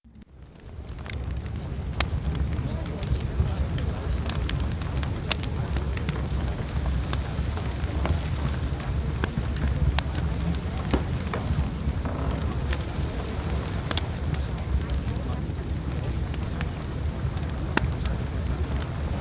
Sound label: Fireplace